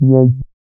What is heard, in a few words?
SYN MC WAH, Roland MC-202